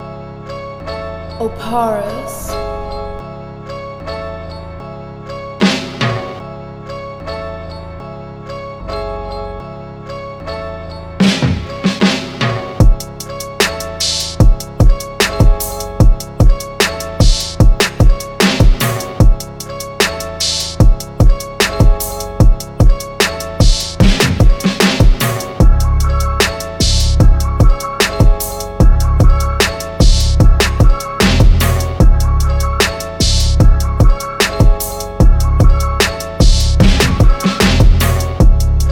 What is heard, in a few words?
75 BPM